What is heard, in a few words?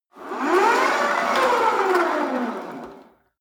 rope trainer pull sequence medium short, fitnessstudio; sport freizeit